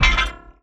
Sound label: robot spring; soundscrate